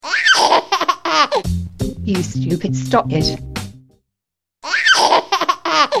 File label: Baby Laugh